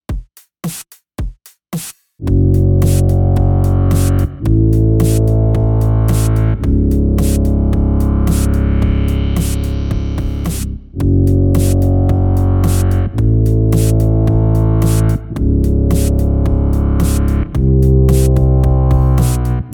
bass pads

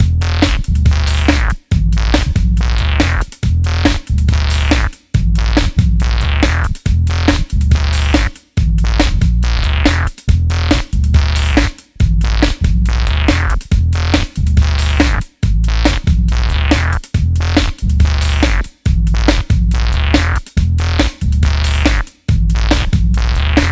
riff, bass; Electronica / Bass; More unknown fuzz + filter sounds

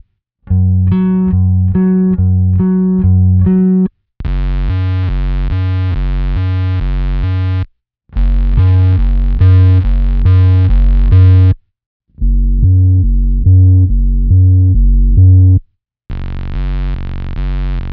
boss oc2 various tones available, bass; Here's a clip demonstrating all of the "factory" tones you can get from the unit.; direct, OCT1 raw waveform

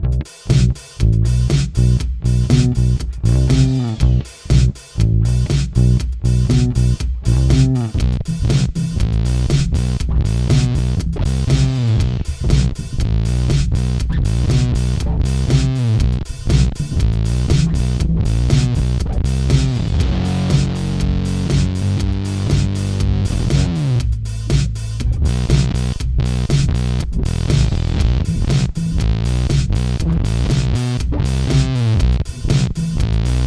wooly mammoth clone, bass; ZVEX Wooly Mammoth clone

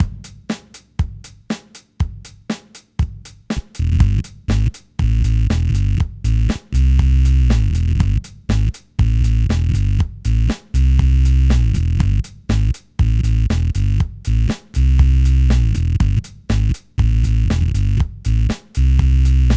blackhole mod, bass; Devi Ever Supermassive Black Hole modded; Installed a 200k resistor between the footswitch lead and the PCB input to reduce the gain

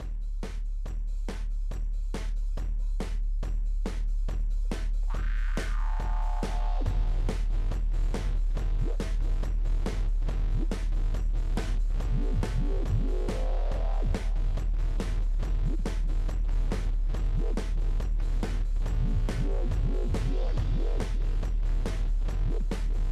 filterfactory hp, bass; Electrix FilterFactory HPF; MXR Bass Octave Deluxe, BOSS VF-1 bit crusher, into the FilterFactory

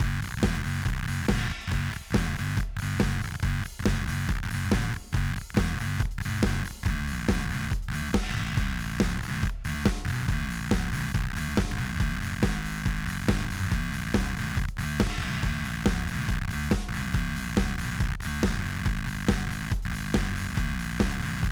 orbit fuzz, bass; Copilot / Orbit VCO; A short clip with just VCO again, unsure on the settings, but it's a nasaly, warbly ring, with Addictive Drums in the background.

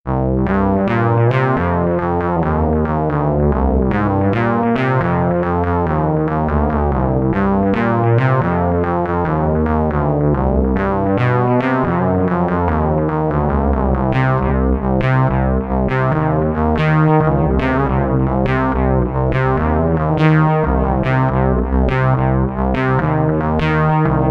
BOSS VF-1 SYNTH, bass

